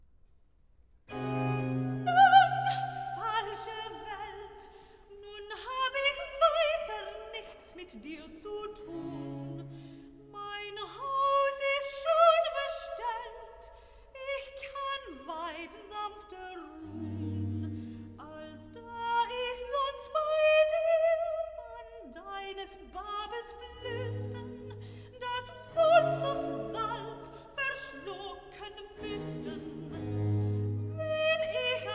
recitativo, soprano; vocal; BWV095; Christus, der ist mein Leben; Nun, falsche Welt; BACH

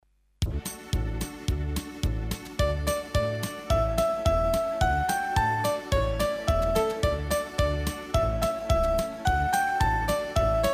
Instrumental, karaoke, Bulgarian; Bulgarian Folk Songs; Children

